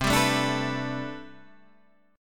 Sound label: A Minor 11th C, Chord